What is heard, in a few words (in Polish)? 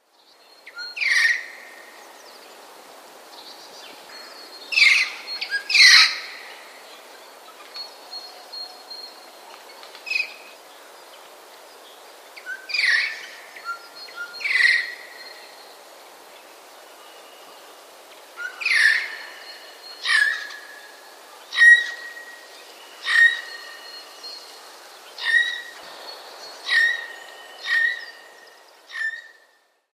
sojka; sójka trochę skrzypiąco "śpiewa", potem wydaje wysokie, dźwięczne okrzyki